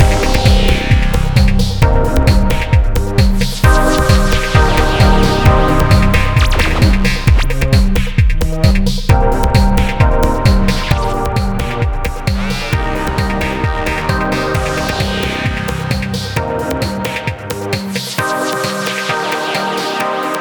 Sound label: Highpass